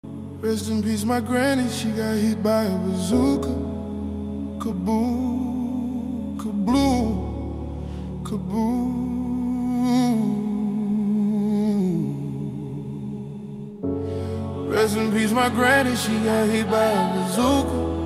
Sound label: Orchestral Version